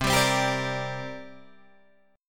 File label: C 7th Suspended 2nd C down Guitar DADGAD